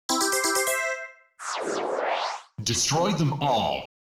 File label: Coin ~ Game Start; Salamander III Original Soundtrack (2025)